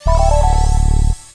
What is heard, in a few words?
BIOS sound